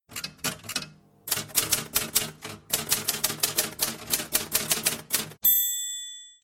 klingelton schreibmaschine tippen geraeusch

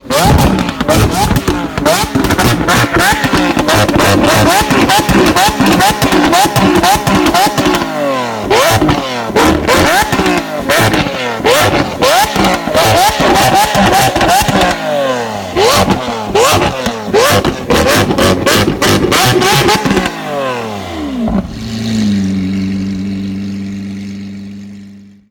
laute sportwagen sounds, lamborghini; aventador sv

